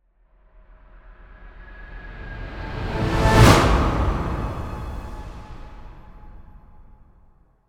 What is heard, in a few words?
Swell To Impact Orchestral; bottlerocket